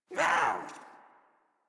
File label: Pet Speak; Warframe; Catbrow; Pet Ship